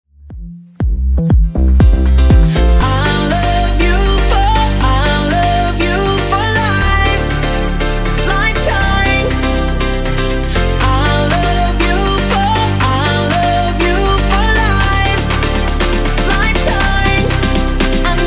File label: Intro Outro 120Bpm